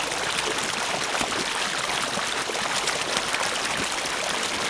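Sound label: water pipe; Star Wars Knights Of The Old Republic I; XBOX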